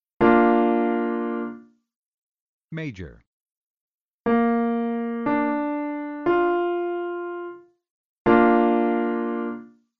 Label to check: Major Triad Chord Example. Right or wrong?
Right